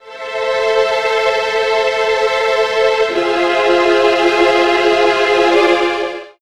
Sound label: A, Strings; Zero-G; Total Drum Bass